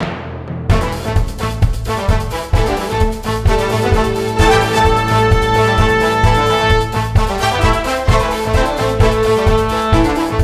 Robo Jazz; mechstermination force windows gamerip 2020

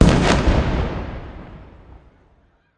Music Frontend

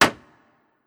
Boat Man Wood; Legend of Keepers (gamerip) (2019)

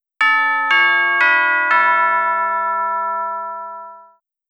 Chimes; Pokémon Sun & Moon; Pokémon The Unreleased Tracks; 3DS

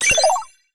eating light green; MiniGames

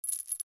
Hookshot over

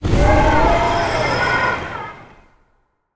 Dis Blizzard

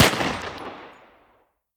Enemy Generic Human Attack

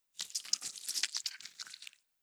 Bolt Move

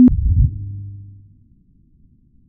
Haptic Selfieteleport; viewfinder

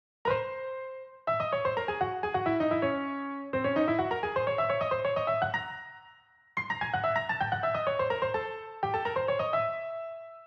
Final boss Piano Solo; music

